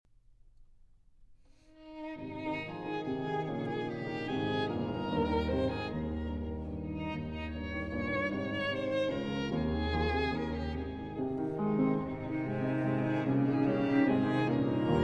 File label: Piano Trio No. 6 in E-Flat Major III. Allegretto Ma Non Troppo